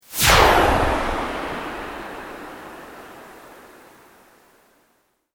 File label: Sweep Organic Medium; Created sweeps, swooshes and other movement effects; Sweeper Sound Effects; Sweepers (Sound Effects:Sound Design)